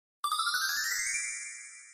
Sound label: Twinkle Sound